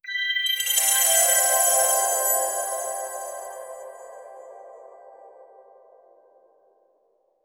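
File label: beauty makeover cute sparkle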